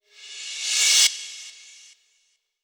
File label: Cymbals Reverse Crash; Vengeance Samples